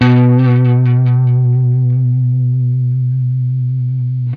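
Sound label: blues long vib, Guitar; 90 s Sample CDs; Quantum Leap - Guitar & Bass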